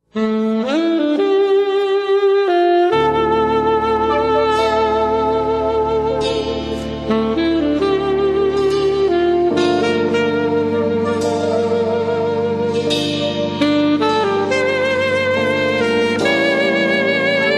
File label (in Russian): saksofon